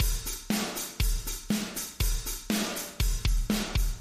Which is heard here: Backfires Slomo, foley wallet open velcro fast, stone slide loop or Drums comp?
Drums comp